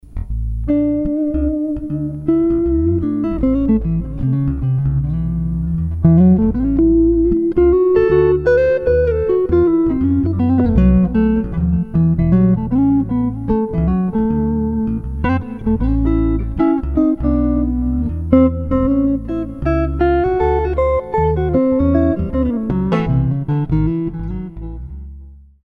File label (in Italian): basso gt; RME Fireface UCX